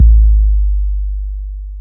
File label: BD-FX, Dance Mega Drums; Best Service